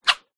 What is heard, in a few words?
sport fishing rod cast whoosh whipp in air; zapsplat